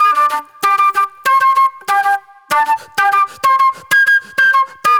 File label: NY 70's Jazz Flute